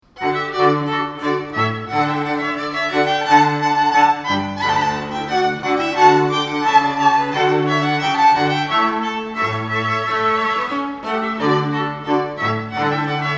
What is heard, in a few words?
Rondeau, strings